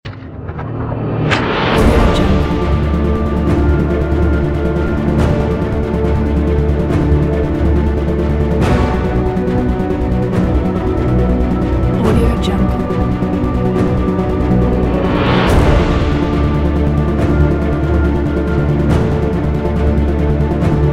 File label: epic cinematic opener